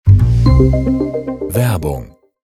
Werbetrenner

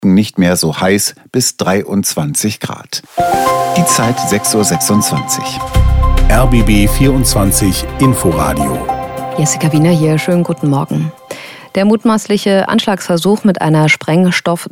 Grun Opener

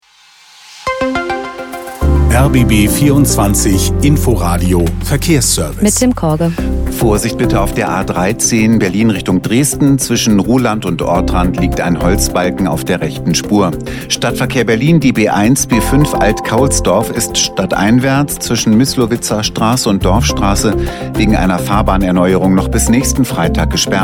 Verkehr

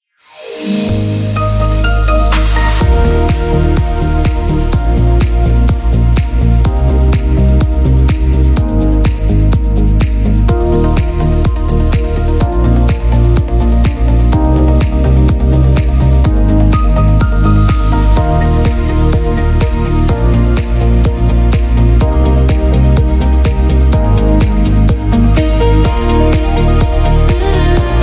Wetter

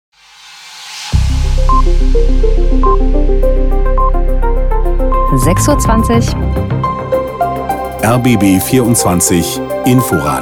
Grun Nachrichten Lang

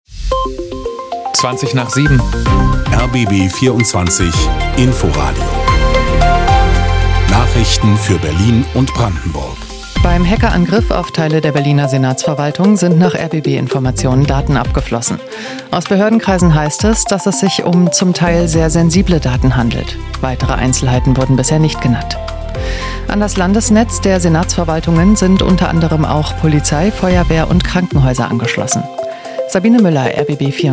Orange Nachrichten Lang

